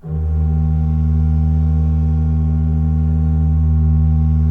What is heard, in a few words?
D1, Cathedral Organ; ILIO; Propeller Islands Cathedral Organ